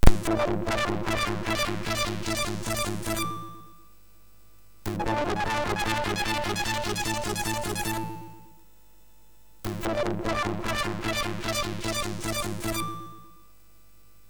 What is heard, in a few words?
sid, MOS6581R3; New Zealand Story; hvsc